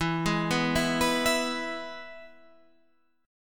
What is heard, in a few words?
E-Major E, Guitar Standard; arpeggiated; JGuitar